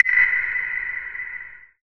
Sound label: normal hit whistle